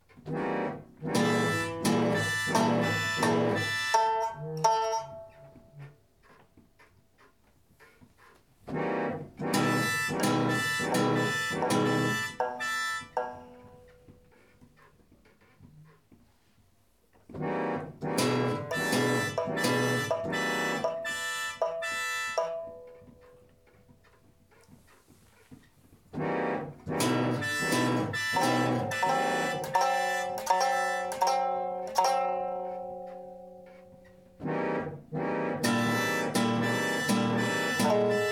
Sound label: harmonium